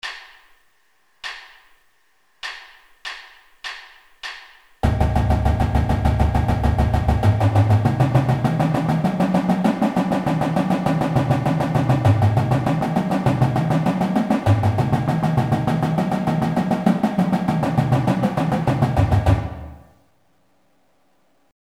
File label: legato medium, drum; marching; Warm-Ups